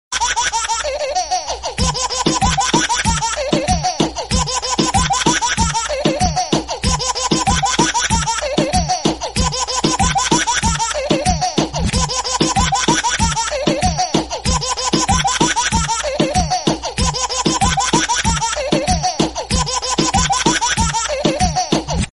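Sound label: Baby laugh tone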